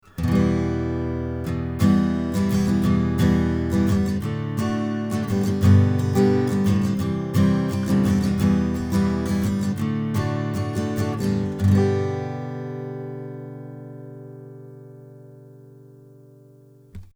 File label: Midas 512 EQ Ribbon LRM V Acoustic Guitar 70Hz Shelf 20kHz 1dB Gain; 11 oclock